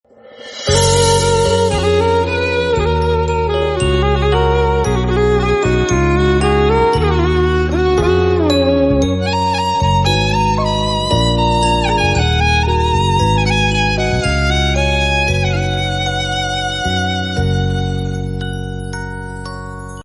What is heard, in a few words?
instrumental violin